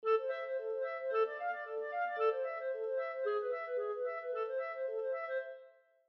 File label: Clarinet; examples in treble clef